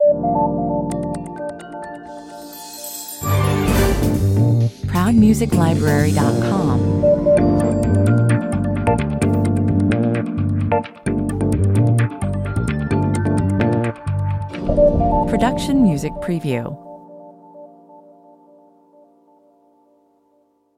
business opener, 130 bpm

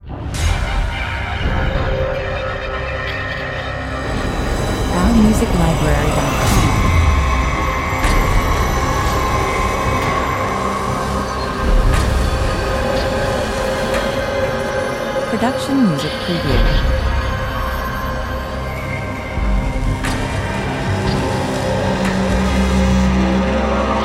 robot duel scifi intro 60 bpm

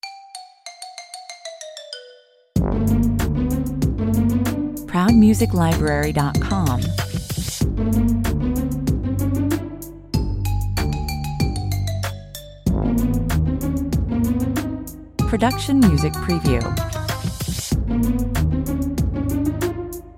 Rising, Percolating, Silly

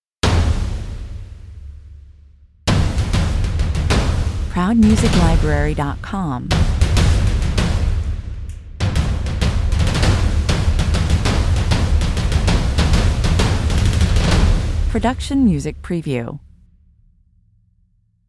trommelfeuer 98 bpm

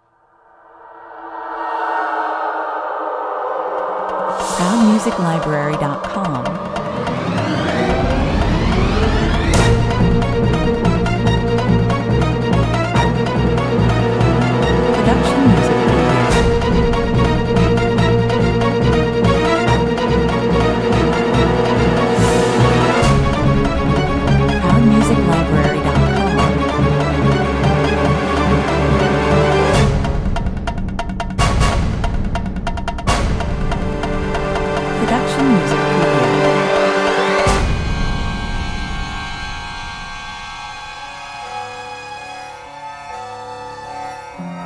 Big action chase theme, orchestral, 142 bpm

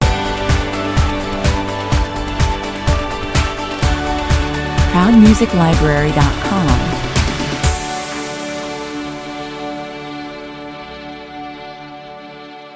stinger

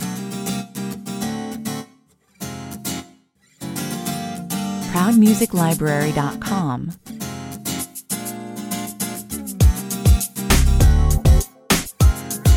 modern rnb 100 bpm

